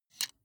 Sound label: sunglasses metal side piece unfold